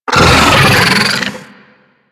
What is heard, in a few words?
Pet Vocal Angry